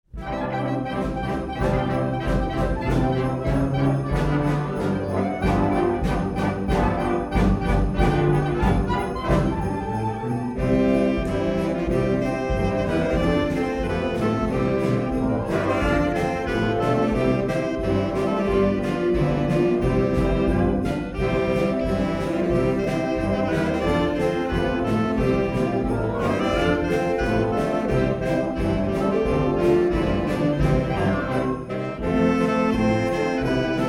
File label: Sax Quartet